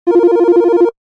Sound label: Office Ring Med, Business Rings